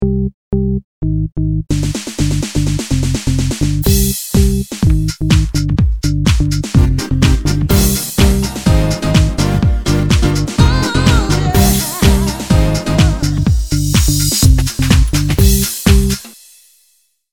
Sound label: Epic Moon Splash; Supposed to be the splash theme song for the game when it starts and shows a logo. Made with Acid Music.; EPIC MOON SOUNDTRACK